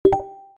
Message

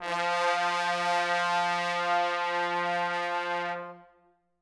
Tbn Ens sus F2, VSCO

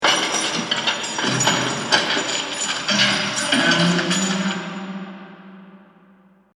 prison noise

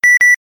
beep double

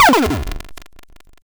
Gentl Shot Bounce

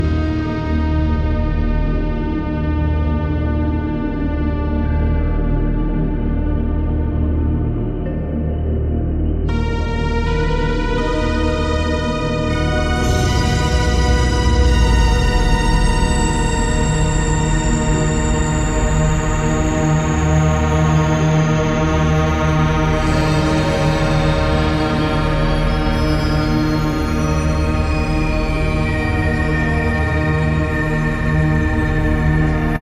music

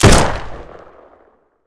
rifle shoot; hygrounds

